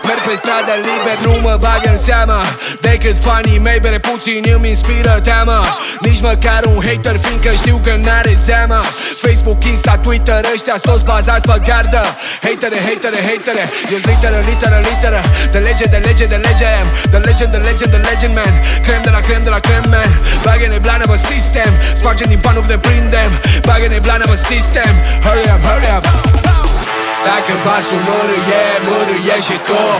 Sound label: 808, ringtone